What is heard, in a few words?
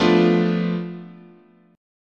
F 6th Flat 5th, chord